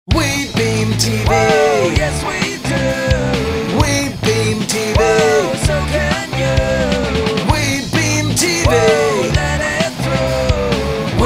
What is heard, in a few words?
JINGLE; WEBEAMTV